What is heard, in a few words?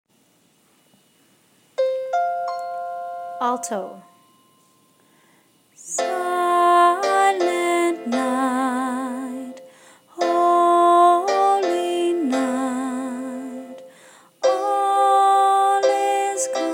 silent night alto